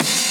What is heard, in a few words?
break